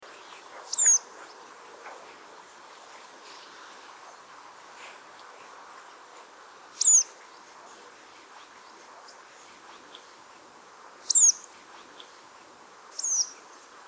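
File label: red-throated pipit; garching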